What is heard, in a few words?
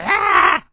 fly; bigbrother